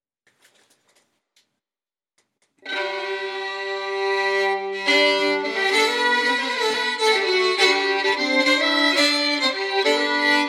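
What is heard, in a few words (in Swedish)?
Polska från Västgötehyttan Karlskoga, säckpipa; Ulf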